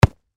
Football Punt; Football (Sound Effects:Sports)

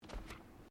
Footsteps Bare feet Carpet Step; Footstep Foley; Human

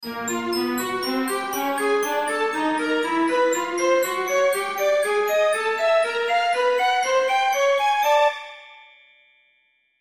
Strings Glock, Strings Glockenspiel Ascend Long; Cartoon; Musical; Cartoons; Blastwave

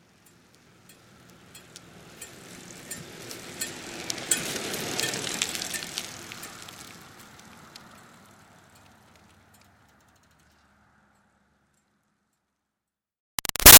Bicycle Retro Cruiser By Med Pedaling

